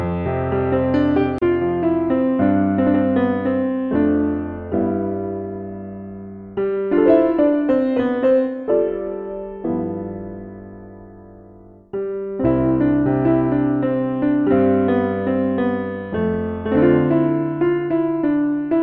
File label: Piano Ver.